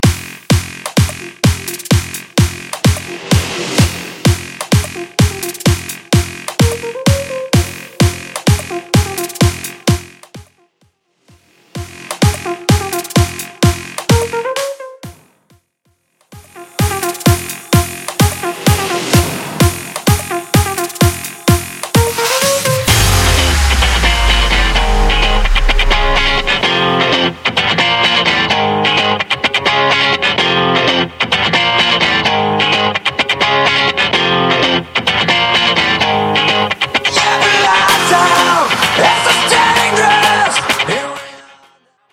90s Rock Bass House

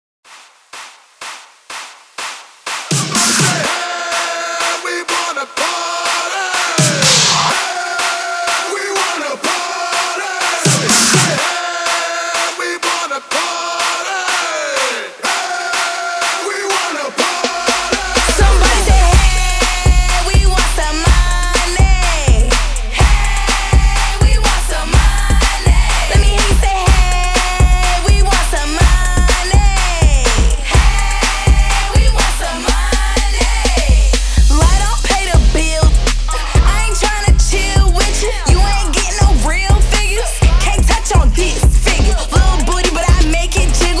PARTY STARTER 124 BPM

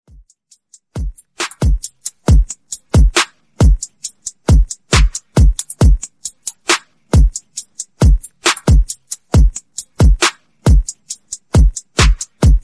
68 BPM; Mixshow Intro Edit

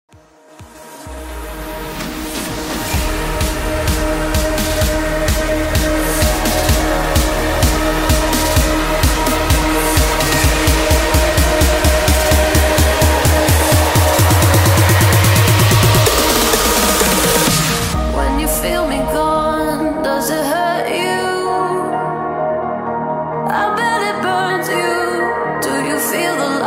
128 BPM

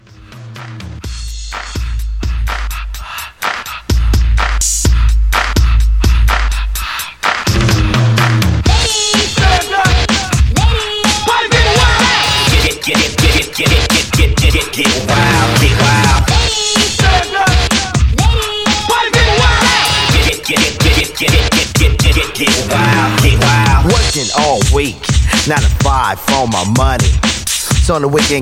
80s 126

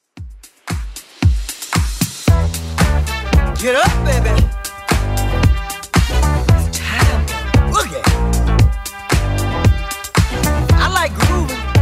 80s Club 114 bpm CLEAN

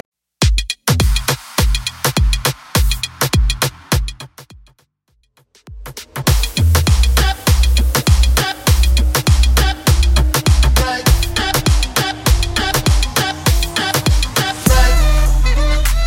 Dancehall RMX Bootleg Quick Mix 102 Bpm

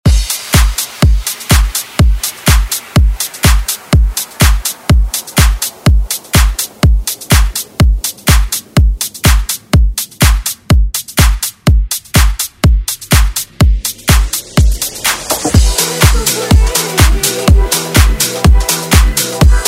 Dance

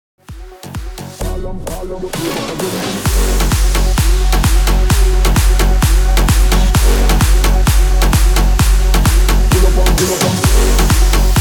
130 BPM

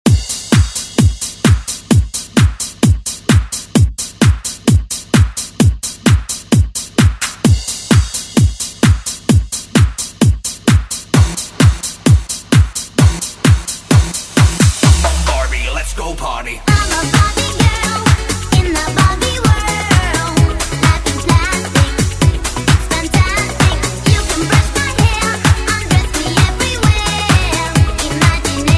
Dance Redrum

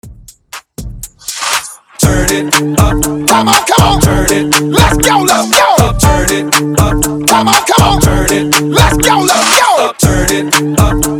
In Outro Up Extended 120 BPM

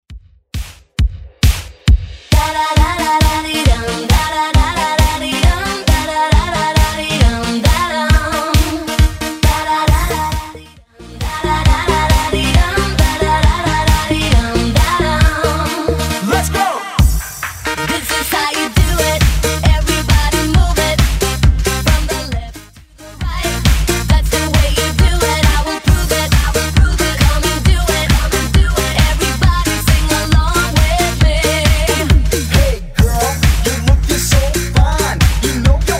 90s 135bpm; Redrum

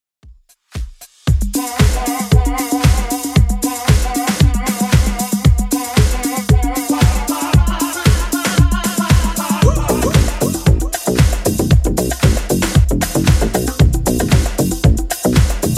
80s Redrum 115bpm

